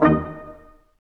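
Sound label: BRS Q.SHT, Roland, brass staccatos, quintet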